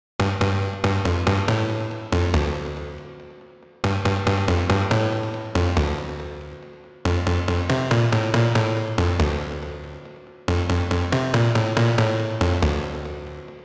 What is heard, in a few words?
UNCompressed Reverb Bass